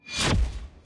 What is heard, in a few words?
illusion end; Minecraft; Votive 1.21.1; Votive Content